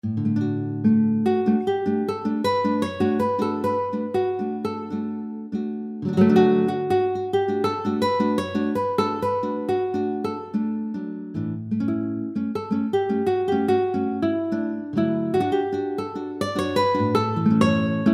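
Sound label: ACOUSTIC STUDY GUITAR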